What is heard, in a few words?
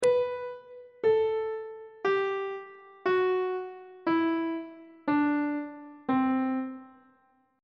c lydian mode descending on treble clef